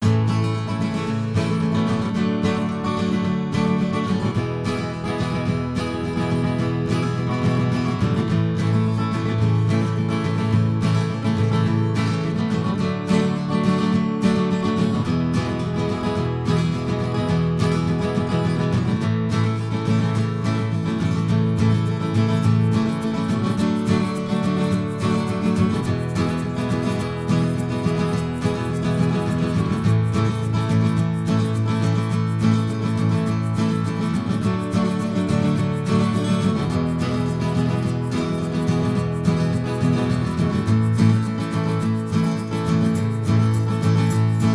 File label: instrumental; environmental education